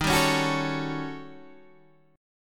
D sharp 7th Sharp 9th Flat 5th

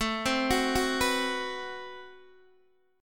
A Minor 6th Add 9th A Guitar Open D

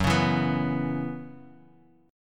Gb 7th Suspended 4th Gb down, chord; Gb7sus4; strummed; JGuitar

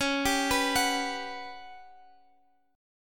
G Major 7th Flat 5th C sharp

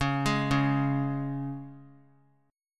Db 5th Db down; Db5 arpeggiated; JGuitar

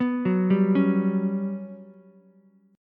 G Major 7th Flat 5th, Ukulele; GM7b5 arpeggiated

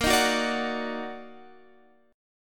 D sharp Minor Major 7th A sharp

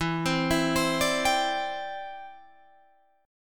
E Minor 7th Sharp 5th, down, Guitar, DADGAD; Em7#5; arpeggiated

